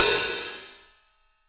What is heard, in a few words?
OHAT, NATIVE HH